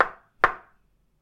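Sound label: knock on wooden pillar; knocking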